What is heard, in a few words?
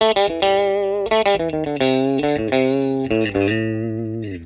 STRAT POS 2 LICK, S pickup set